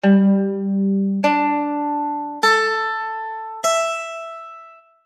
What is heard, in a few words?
mandoline; AJ Hipkins